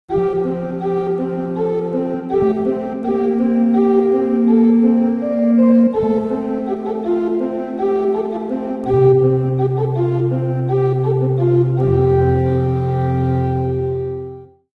Organ Stretch

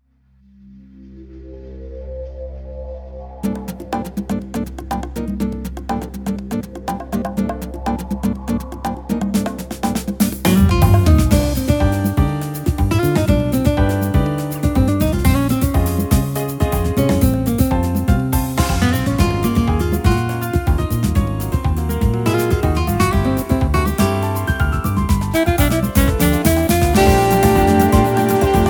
Latin Jazz

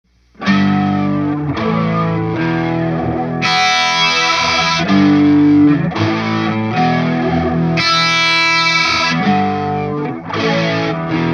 country phaser

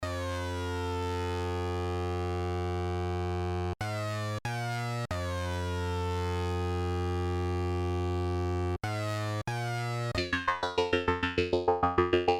synth

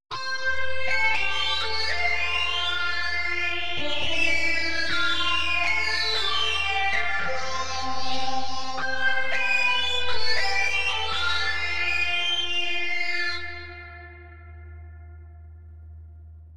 interstellar burst

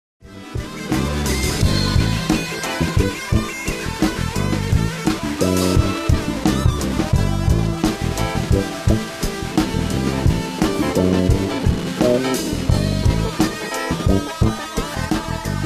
P-Funk excerpt

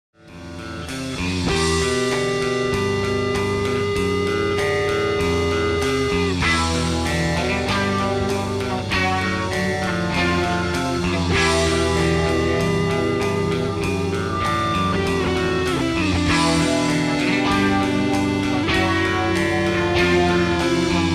B Muff

